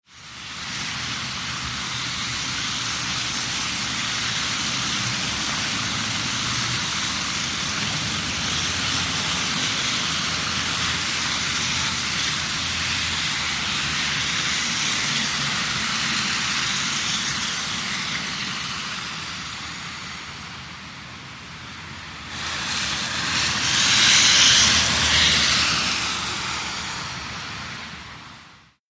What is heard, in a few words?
City Traffic Slush, wet traffic